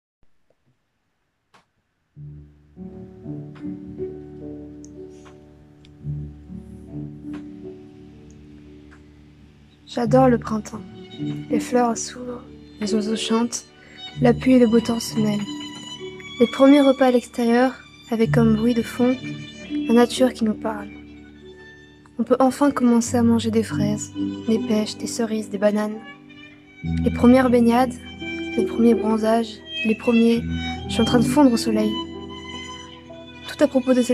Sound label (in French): fantaisie musicale